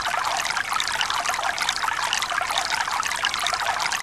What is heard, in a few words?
stream